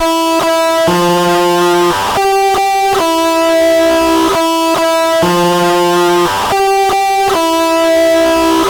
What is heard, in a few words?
Gentle Riff Fuzzy Box 2X